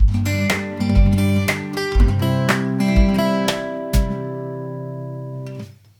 120 bpm upbeat country

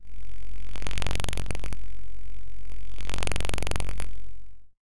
electrical static interfac, electronics

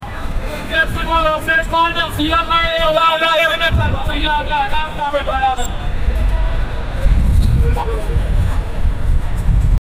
historic London

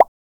a chill satisfying single

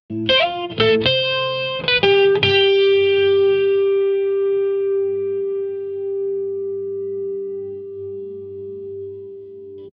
glam guitar

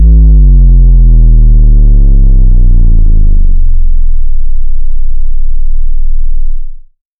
high rarity item drop; god; items

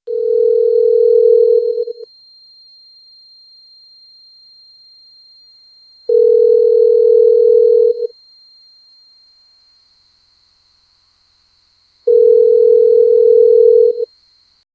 a solitary telephone ring

